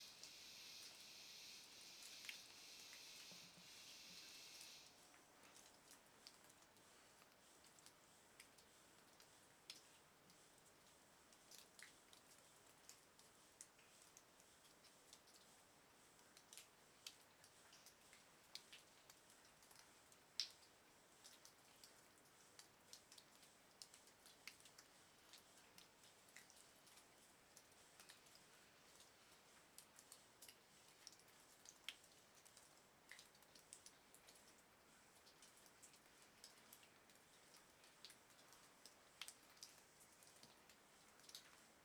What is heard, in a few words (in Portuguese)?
Interior caverna com muito gotejar e cigarras oscilantes; ambientes naturais; Coleção Sonora do Cerrado